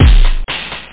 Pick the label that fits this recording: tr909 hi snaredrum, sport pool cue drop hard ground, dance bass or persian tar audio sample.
tr909 hi snaredrum